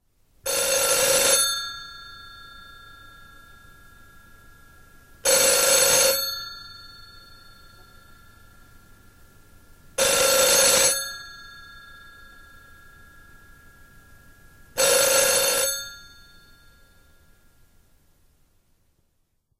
Ηχητικά παραδείγματα Τηλέφωνο

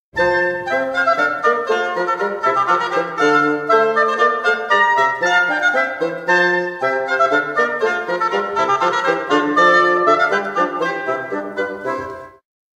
Οικογένεια ξύλινων πνευστών

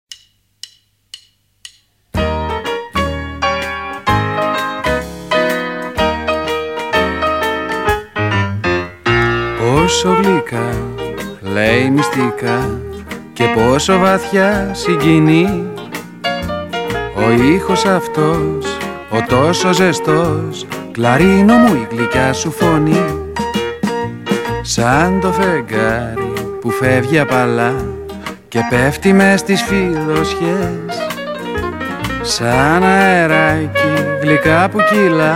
Για ένα κλαρίνα

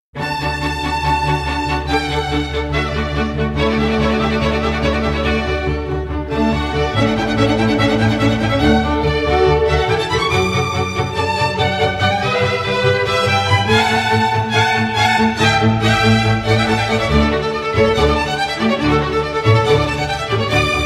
Divertimento σε ρέ μείζονα

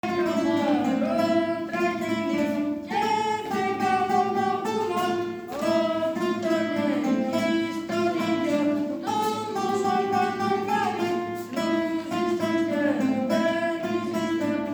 Κάλαντα; ΖΕΠ 1 Γυμνάσιο Χαλκουτσίου

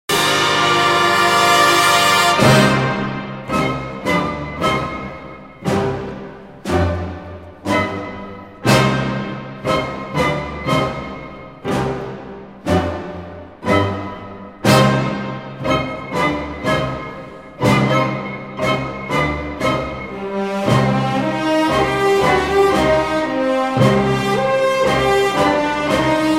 Χάλκινα πνευστά συμφωνικής ορχήστρας Adagio dramatico; Τέλος της Πράξης ΙΙ

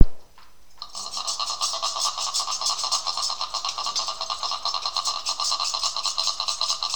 ντέφι ξύστρα

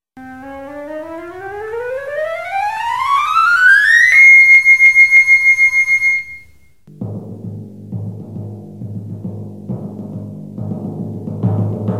Φλάουτο Συμφωνικό τύμπανο

